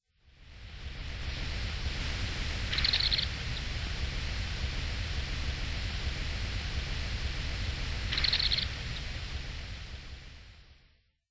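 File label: Lapland Longspur; mukawa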